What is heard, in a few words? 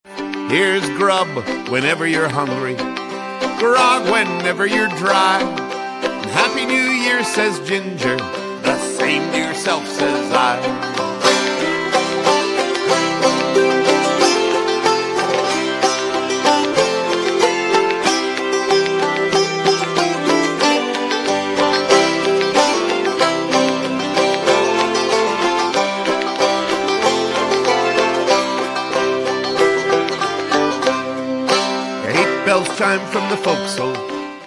e bells